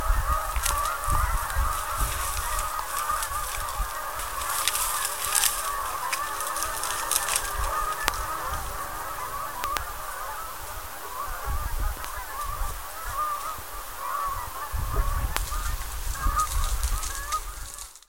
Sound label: canada geese flock wetlands; mendenhall